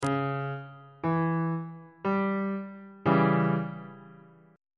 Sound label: c sharp diminished chord on bass clef